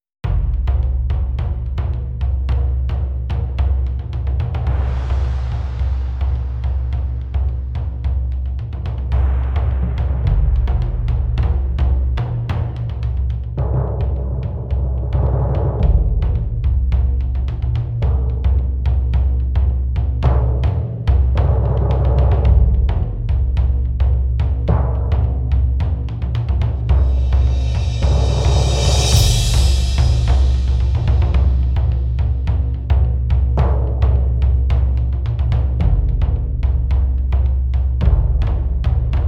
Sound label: drums